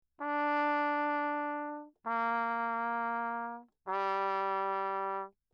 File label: TRP MUTE Lechner ohne low; Perinétventiltrompete; Mutes